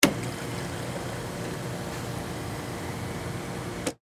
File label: Fountain Drink, Soda, Machine, Dispense, Short; Soda Guns